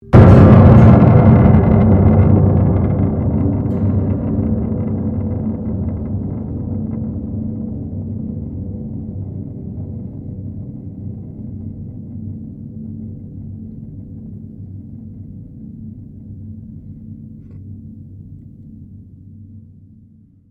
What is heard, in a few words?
PIANO UPRIGHT BROKEN BASH, Upright piano without keyboard; Pianos (Sound Effects:Musical)